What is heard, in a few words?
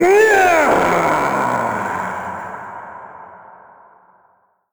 dorian death; Crypt of the NecroDancer